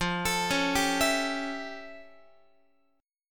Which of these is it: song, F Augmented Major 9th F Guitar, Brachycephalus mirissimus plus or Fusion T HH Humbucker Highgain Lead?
F Augmented Major 9th F Guitar